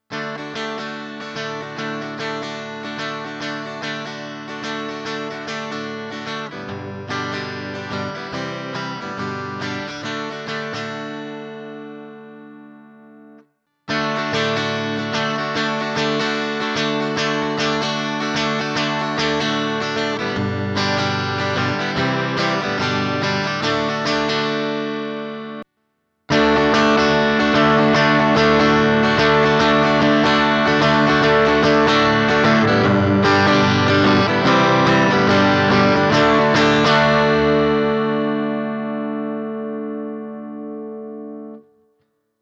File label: Katana Clean Boost